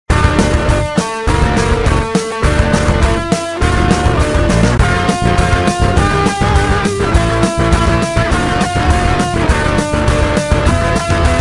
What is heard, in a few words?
Heavy Metal Punk